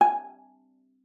G#5, VIOLA PIZZ; Xsample VOL-5; 90 s Sample CDs

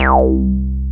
BAS, Eurotech; Sounds Good

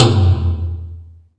FLANG, SR-16 DRUMS; Drum Mashines; EdgeSounds

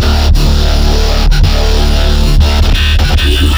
weird bass

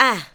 ADLIBS 90; Ueberschall; Jam Box

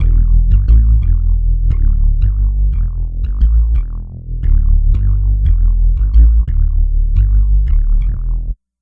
Synth Bass; Bass X

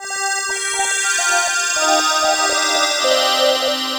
Glass House, Synth Textures; Sonic Foundry (Sony Creative Software); The Electronic Music Manuscript Collection

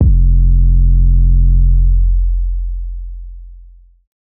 Dusty 808 F, hip-hop drum kit; 99Sounds x Monosounds; Moon

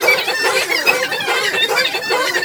RITUAL; Spectrasonics; Heart of Africa 1; RITUALS

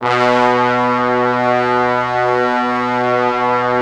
B1, Orchestral Brass; Best Service ProSamples vol.20; AKAI

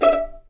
E5 PIZ MF, string; East West; The Ultimate String Collection

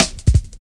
Rim Shott Cut, Drum Loops 166.5; Zero-G Jungle Warfare 1, 2 & 3

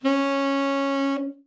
TEN SFT, Sax; TEN-SFT SHRT; Giga Samples Collection

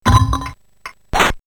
Elec FX Computer Game; X-Static Goldmine

